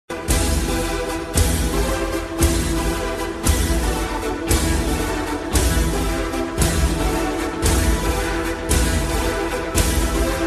ringtone

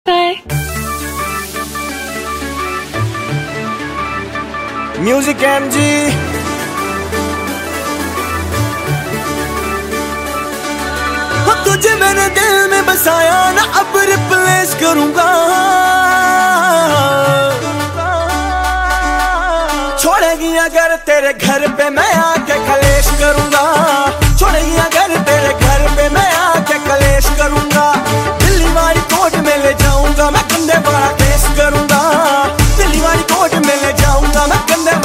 new hindi songs

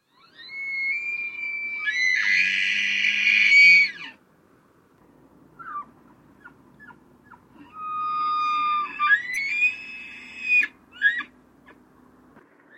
bull elk bugle

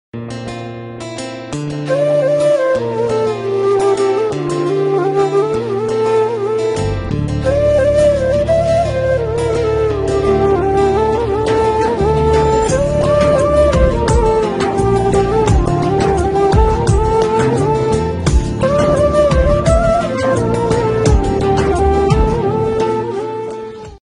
flute music ringtone

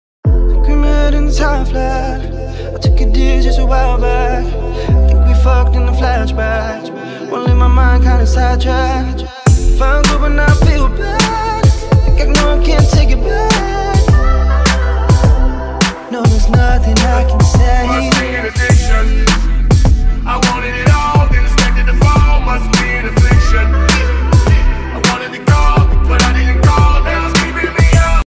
song ringtone

